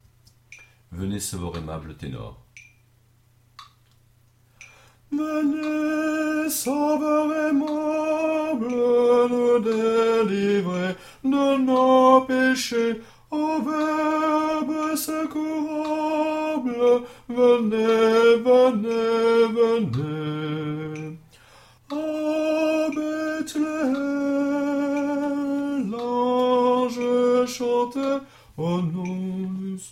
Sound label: tenor; Venez sauveur aimable